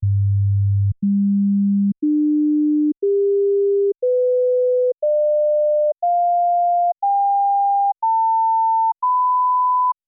sinus tones 48kHz

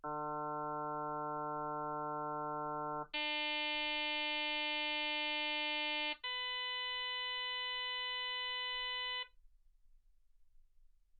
harm sinus 48kHz

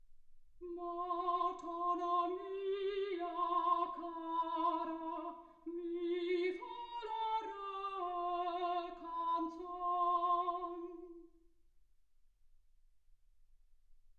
soprano

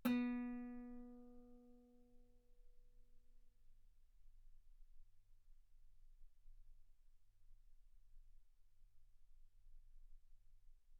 Guitar B3; ff; sul B

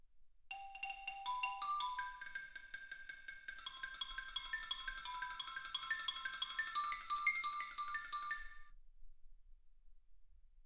xylophone

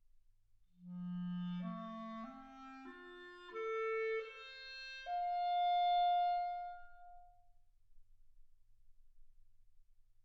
clarinet

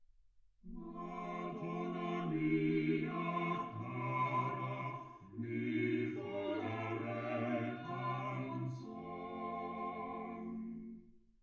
quartet

